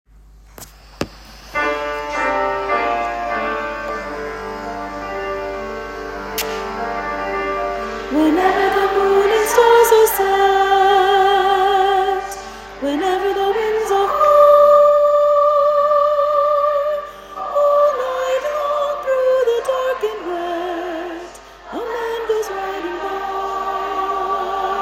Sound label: windy nights soprano